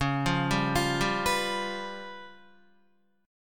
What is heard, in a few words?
C sharp 7th Flat 5th, Guitar; arpeggiated; chord; 8,8,7,10,7,7